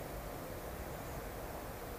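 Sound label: Redwing, AudioMoth in case; nocmig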